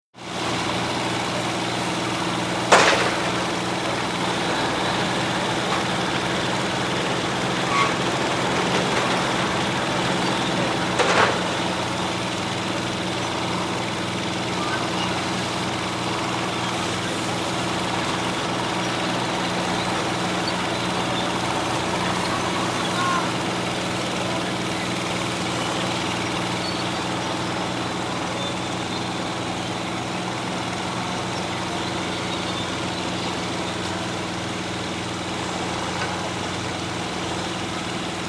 CONSTRUCTION & FACTORY Background CRANE: EXT: Distant crane with wrecker ball, compressor & bulldozer in Background.